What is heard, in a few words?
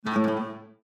Windows Hardware Fail; Windows 7 Sounds; Heritage